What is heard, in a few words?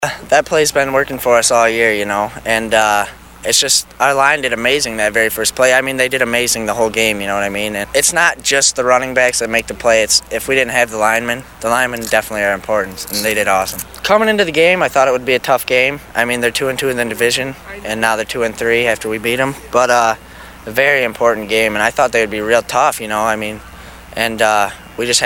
Riff